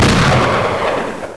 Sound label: komic bang